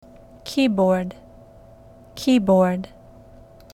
keyboard

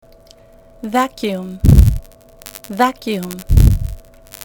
vacuum

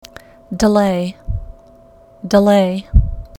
delay

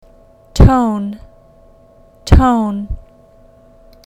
tone

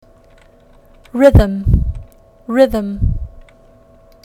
rhythm

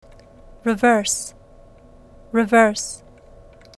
reverse

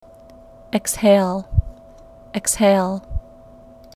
exhale

